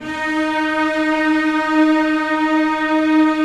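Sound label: celli sustain d#2, Symphonic Orchestra; Sonatina Symphonic Orchestra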